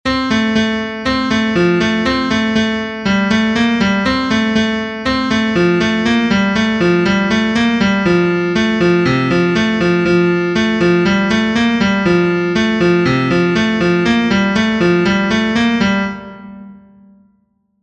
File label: generated melody; StyleTransferImages